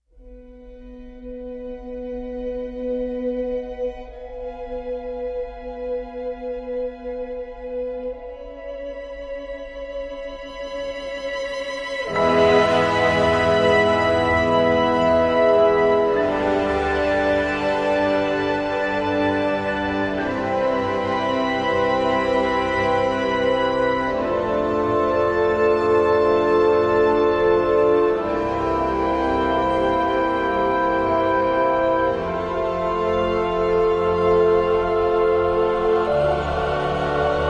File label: Lightness Ambient